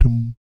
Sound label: BS DUM, Vocal Planet Jazz & FX; ILIO; BASS DUMMS